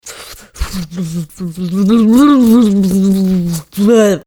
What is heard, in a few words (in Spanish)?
ritmos